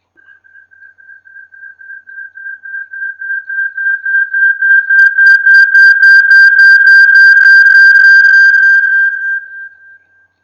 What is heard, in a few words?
electronic interference